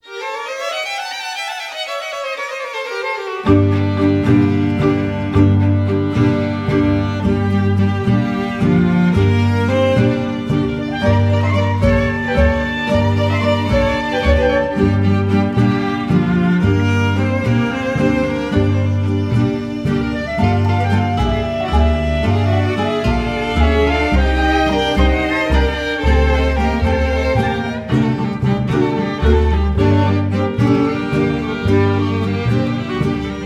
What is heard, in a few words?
Balkan vorsp drachen